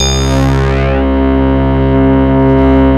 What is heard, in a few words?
WIZZO SWEE; Greytsounds VOL-1